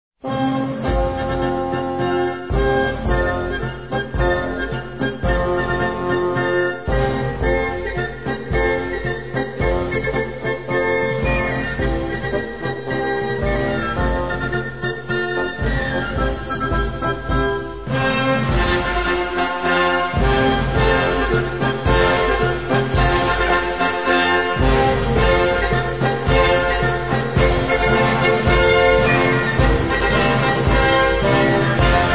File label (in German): Marsch; Pappenheimer